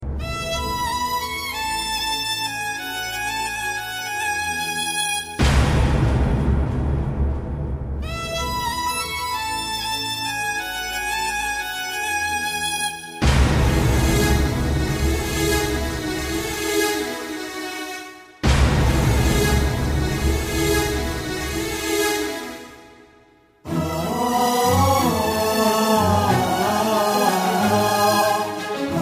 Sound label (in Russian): indijskaya muzika